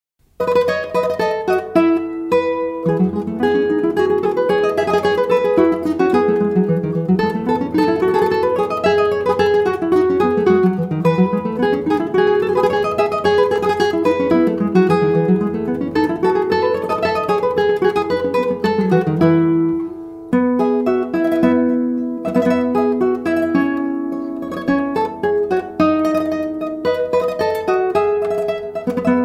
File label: jingle bells